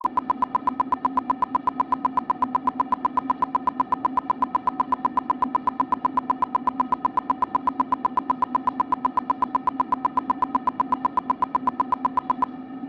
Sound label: burst 16ms 1kHz; LaSpezia; 30V; S1 Mic2 tubetto; CESI